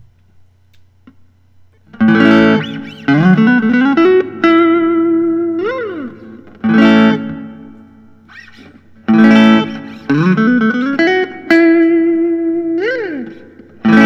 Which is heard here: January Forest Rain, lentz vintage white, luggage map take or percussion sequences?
lentz vintage white